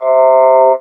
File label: Talk Box "oh"